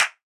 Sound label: clap; Heaven Studio Plus; clap Trap